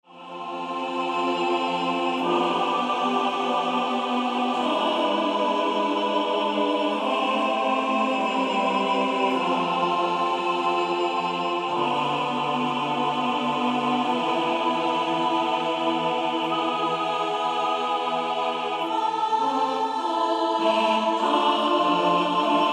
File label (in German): Chor